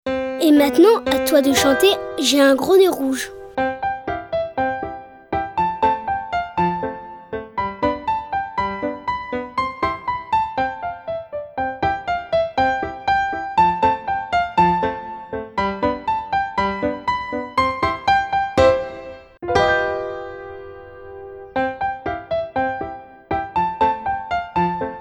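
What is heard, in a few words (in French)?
J ai un gros nez rouge Instrumental, comptines pour les tout petits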